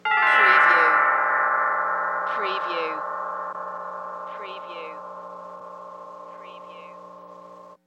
Glissando Bell Creepy Desce

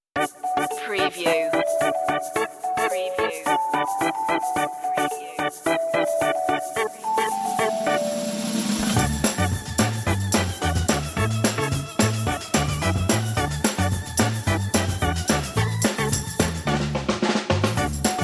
organ bells drums bass and perc

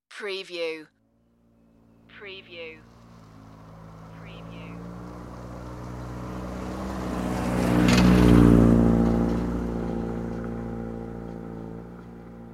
pickup passing

